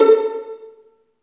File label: Vlns Pizz; Roland L-CD702; Vls Pizz